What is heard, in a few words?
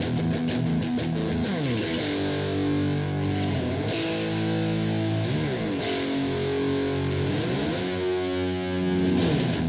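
just guitar loop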